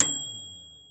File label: Cooking